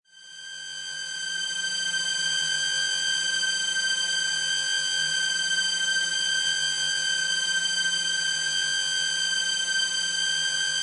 Seriously Disturbing Sound, Painful, alarming, high pitched; squeal. Headache, torture or extreme danger